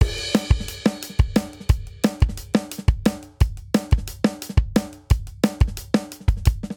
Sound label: Straight Five Rock 06 Verse Variant 5_4 177 bpm, drum; Drum Booth